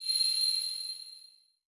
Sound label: Reward Coins Sparkles; Subway Surfers City Sound Effects; Android